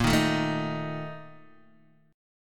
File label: A sharp Major 7th Suspended 4th, Guitar DADGAD